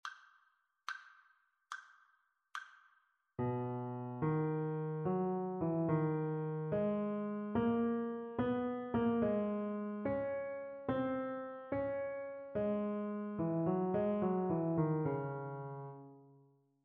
melodic dictation; ear training

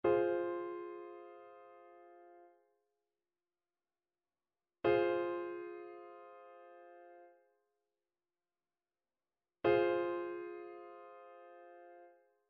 trichord; trichord identification

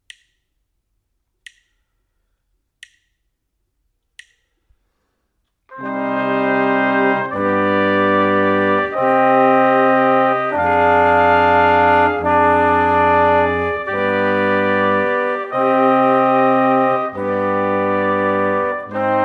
harmonic error detection; ear training

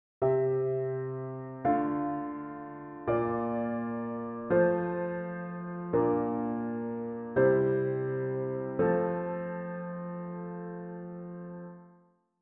harmonic error detection; ear training

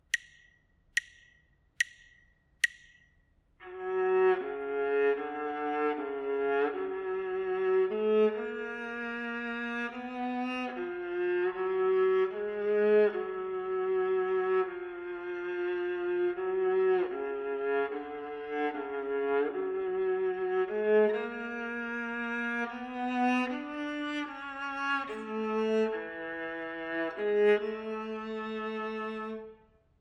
melodic dictation; ear training

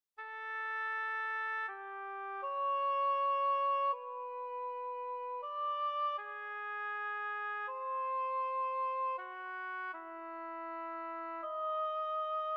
melodic error detection; ear training

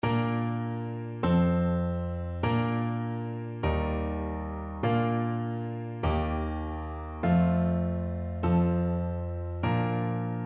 harmonic error detection; ear training

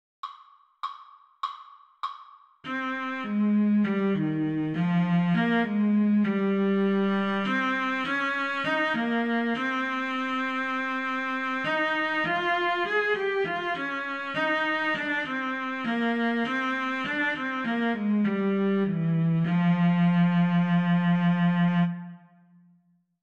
melodic error detection

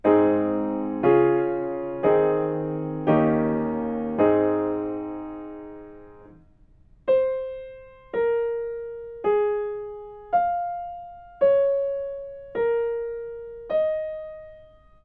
melodic fragments

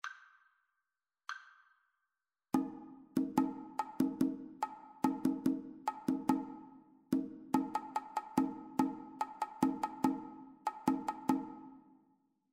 two part rhythmic dictation

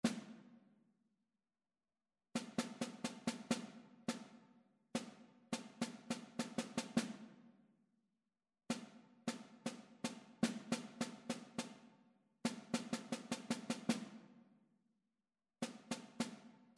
rhythmic error detection; ear training

